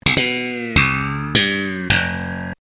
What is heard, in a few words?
sitcom transition bass riff; seinfeld